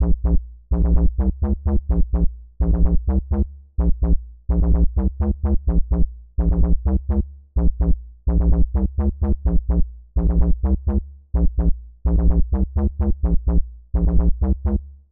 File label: techno edm mid bass; instruments; loops